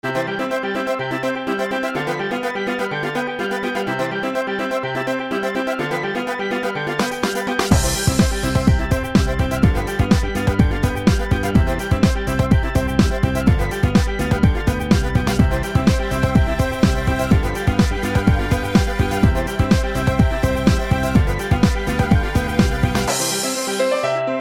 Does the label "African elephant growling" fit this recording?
No